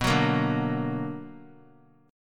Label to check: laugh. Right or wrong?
Wrong